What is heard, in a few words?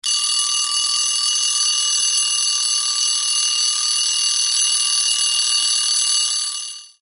Alarm Clock Bell Ring